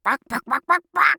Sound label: Chicken; Mage; Realm Royale